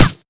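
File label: suit enemy damage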